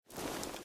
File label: holster, weapons